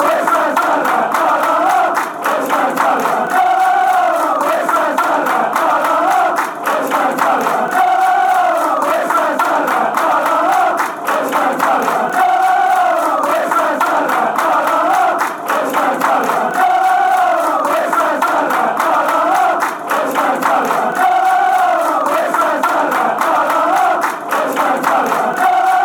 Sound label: lalalala, fanchants